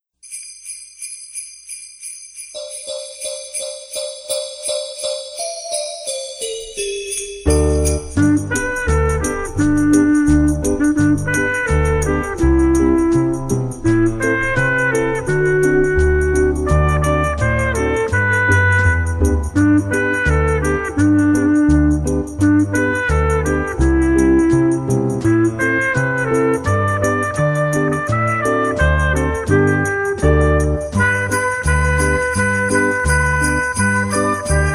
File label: Jingle bell